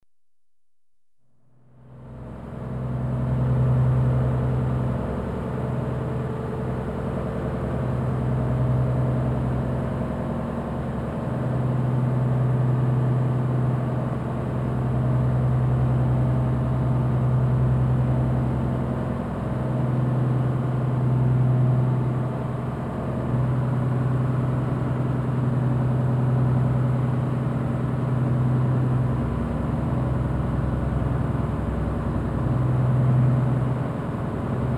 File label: Lifeboat, engines constant run; The BBC Sound Effects Library